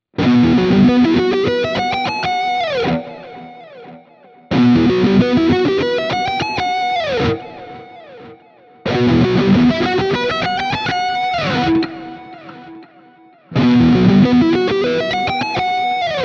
Schecter Exotic Ziricote Lead Riff B all positions